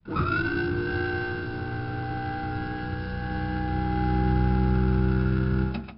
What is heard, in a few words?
Gear down, Helicopter K52